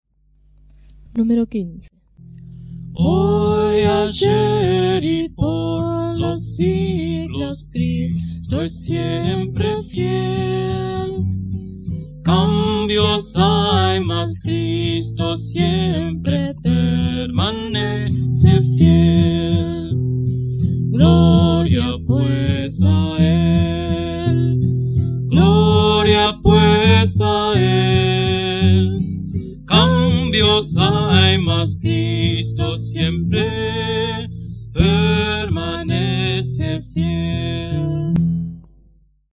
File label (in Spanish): Coro, himnario niños